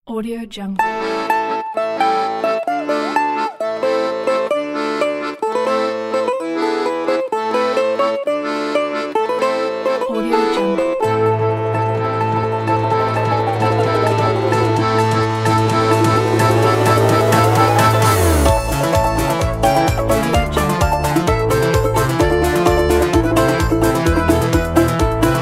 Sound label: dancing russian